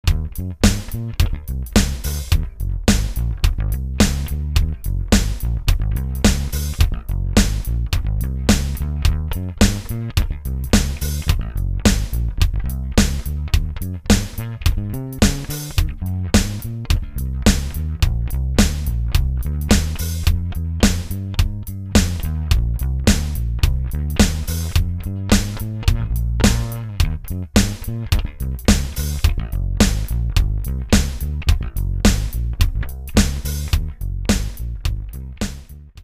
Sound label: Ampeg SVP CL